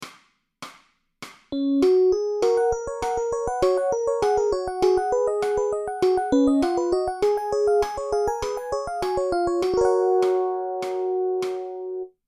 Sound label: jr melody; ikebukuro